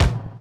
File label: TOM, Dance Mega Drums; Best Service